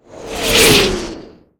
artillery incoming

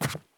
stop wood; Escape from Tarkov

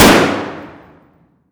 adar fire indoor distant; Escape from Tarkov

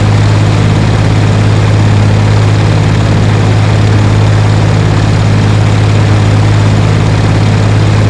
Marder engine max; DCS

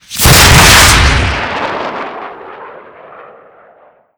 javelin ignition; Modern Warfare

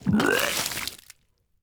vomit; Rust

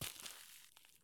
soil walk; Escape from Tarkov

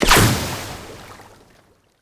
water hit, damage; DCS

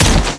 punch human

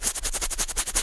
scratch; Rust

